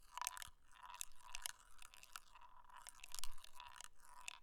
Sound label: Ball rolling in box